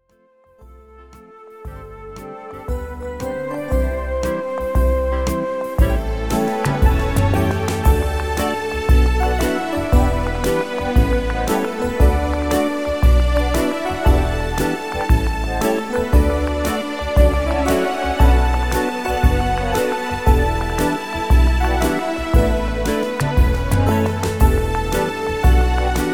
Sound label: BLUES